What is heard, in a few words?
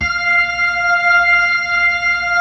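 B-3, Hammond B-3 organ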